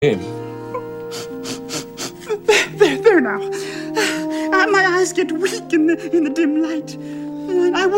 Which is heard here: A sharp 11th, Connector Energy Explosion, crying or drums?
crying